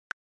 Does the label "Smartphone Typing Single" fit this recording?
Yes